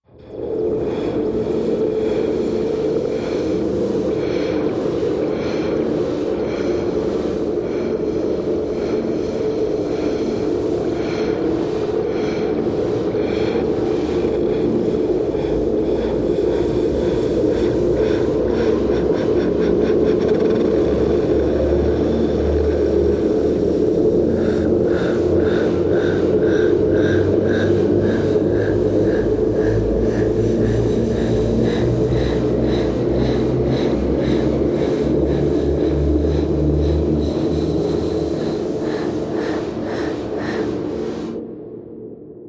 Machine, Breathy, Wind, Electrical, Speeds Up, Sci-Fi; Breath Capture